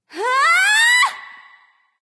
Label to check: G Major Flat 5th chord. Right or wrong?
Wrong